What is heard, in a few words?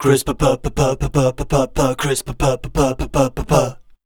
SCAT C, Voice